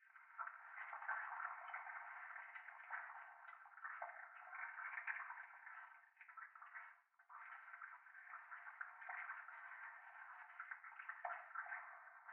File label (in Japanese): トイレ フェラチオ 触手